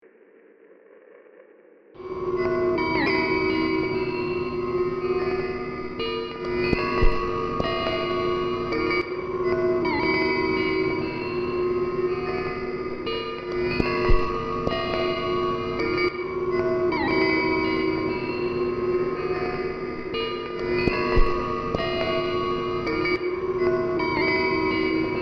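Key Chimes Short EQed Single Note Repeated With Scratch Rhythm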